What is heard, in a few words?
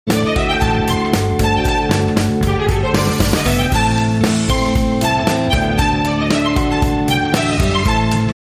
Atlantic Wave